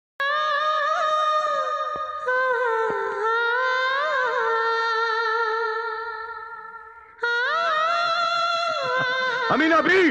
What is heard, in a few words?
emotional ringtone sad emotional; govinda; maharaja movie